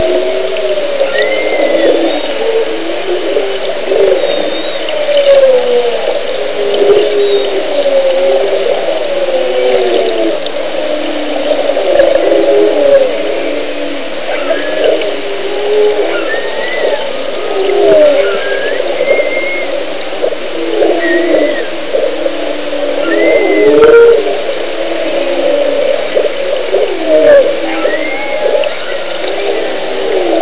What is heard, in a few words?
humpbacked chorus